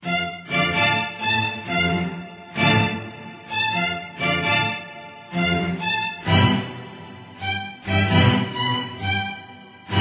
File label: D Minor Dark Strings